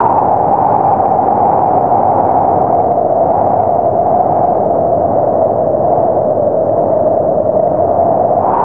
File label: Strong Wind; ambience pet rock